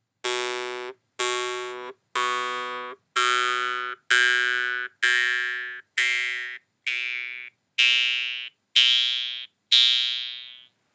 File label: danmoi mono; Instrumentensammlung